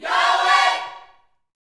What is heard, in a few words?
Vocal Gospel PHRASE